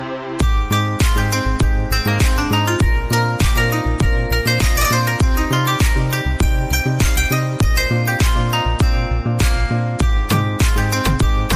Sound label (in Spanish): tono de llamada Huawei Y9 Prime